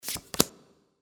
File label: flip